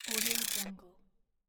game biomechanical sound

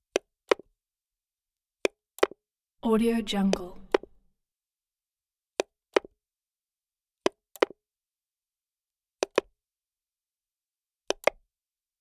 macdonald 2way 3channel cb; soundmorph

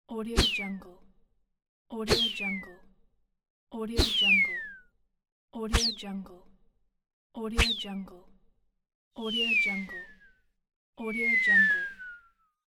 thrown object; creatorium-sound-design

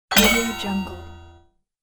technical game collect

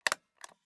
object office stapler staple; smartsoundfx

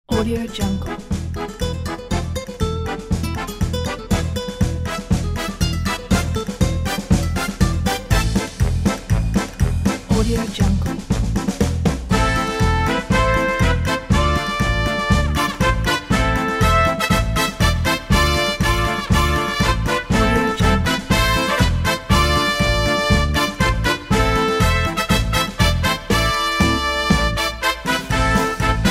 pasodoble trumpet music

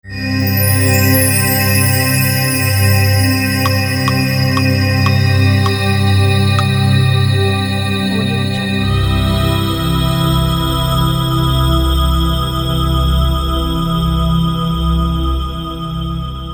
suspense sting

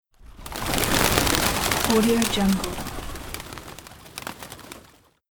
flock of pigeons

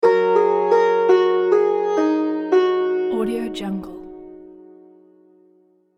gentle sitar

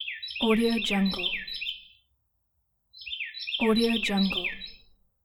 bird chirps

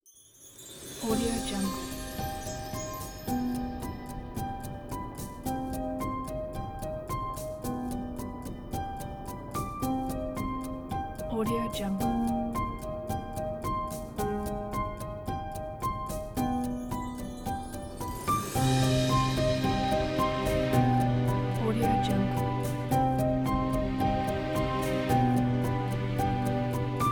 documentary

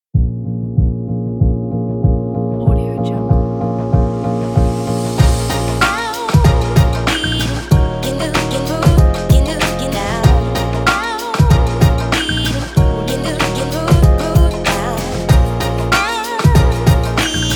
summer fashion chill

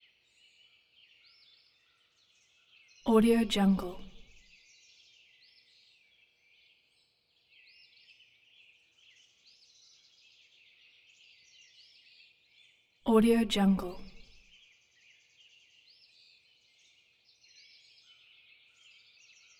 ext early am birds; collanderson

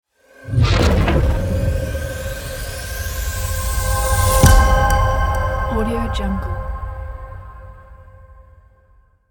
impact logo

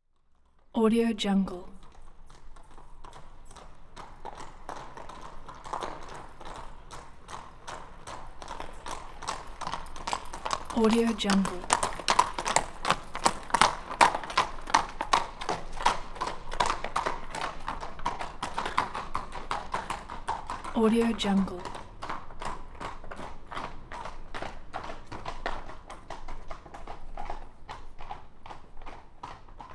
two horses slowly trot by on cobbled street

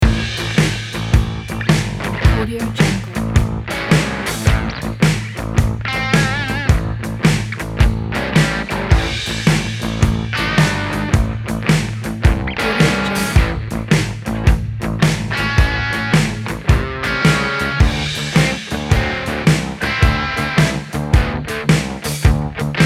underground blues

